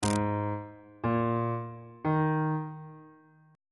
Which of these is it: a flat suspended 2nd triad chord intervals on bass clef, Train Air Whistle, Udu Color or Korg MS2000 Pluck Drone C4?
a flat suspended 2nd triad chord intervals on bass clef